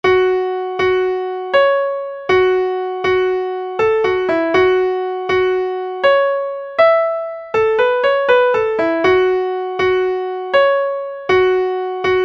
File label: slip jig